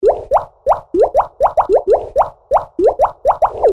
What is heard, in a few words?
beat